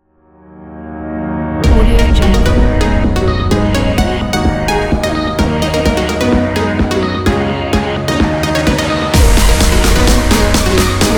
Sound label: this is edm